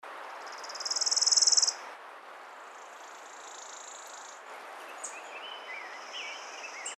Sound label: WARBLER WORM EATING, birds; species/birds; wbba